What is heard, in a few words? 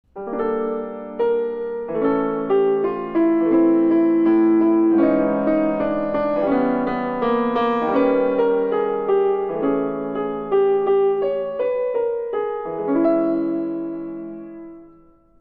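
sonata violoncello piano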